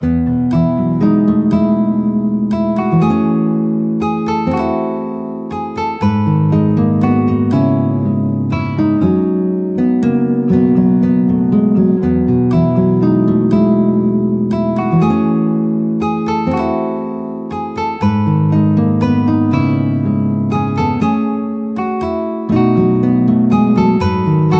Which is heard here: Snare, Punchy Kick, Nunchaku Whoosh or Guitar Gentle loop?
Guitar Gentle loop